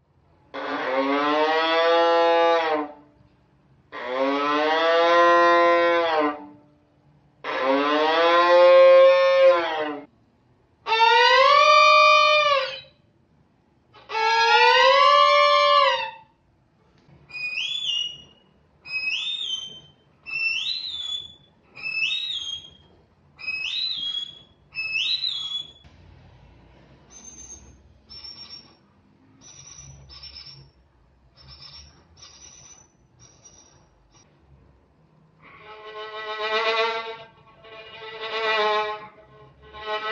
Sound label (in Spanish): animales con violin